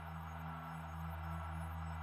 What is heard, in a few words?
reel spin snd; netent